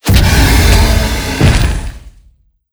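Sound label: lava demon death; wdemon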